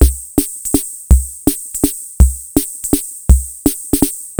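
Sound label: J-WALTZ, drum machine; Crypton - DrumBase5000; TR-66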